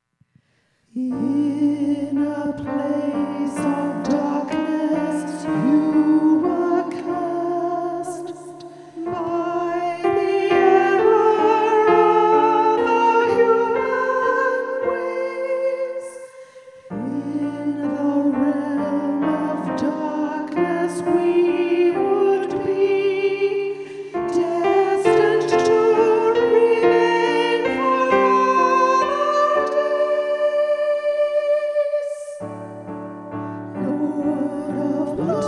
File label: SATB